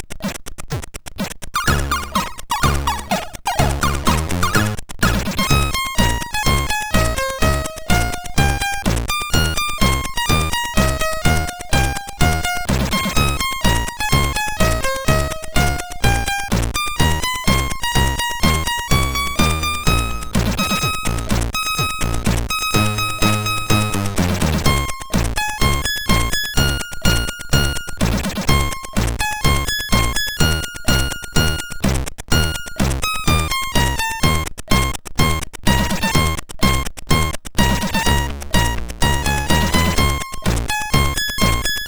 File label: Berliner Luft, sid MOS6581R3